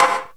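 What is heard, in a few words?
snare reverb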